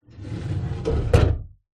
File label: office metal drawer close; zapsplat